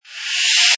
Reverse, Sci-fi sweep/swipe in; Sound Design

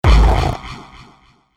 Laser Percussion Shot, Laser Gun

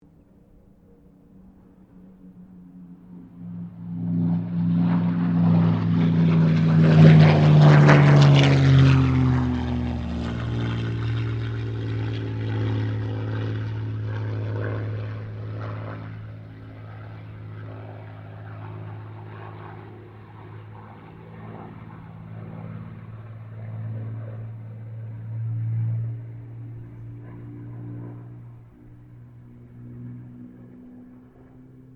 AIRCRAFT SUPERMARINE SPITFIRE MK-XVI PASS

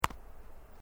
Sport Ball Rubber Bounce Hand Grab, Rubber ball; Bounces; Objects

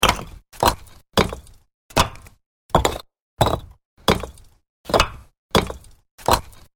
Stacking terracotta house bricks.; Set Downs; Objects

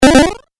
8 Bit Style Jump; video game; 8-Bit Style Jump 02; Video Game Sound Effects

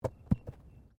Body movement Fall Light Double; Body movement foley to simulate falls and deaths; Wrestling And Struggling; SoundEffects-Foundation1

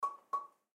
Water Cave Drapes Rock Double Tap Musical; Nature; Blastwave

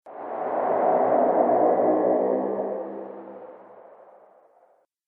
Groaning Winds Graveyard; Scary Soundscape Sound Effects

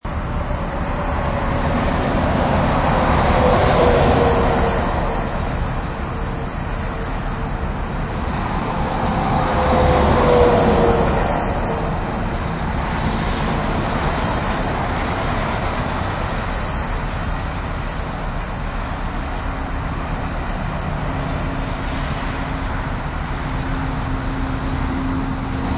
Intrstate, Highway traffic.; Interstate Traffic; Traffic and Cars; Backgrounds

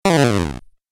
Falling Video Game

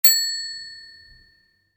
Bell: Small Desk Bell, Single Ring; Musical

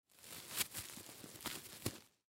Wig Hair On, Wig Long Hair Put On Head; Wig Long Hair Put On Head Pat Down; Dressing Sound Effects; Human; Foley; SoundEffects-Blastwave

